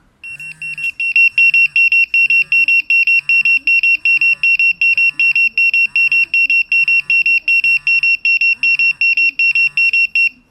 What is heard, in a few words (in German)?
POCSAG Alarmton Dringend